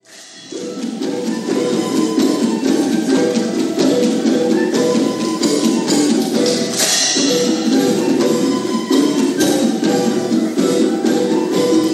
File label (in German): Intro Instrumental kurz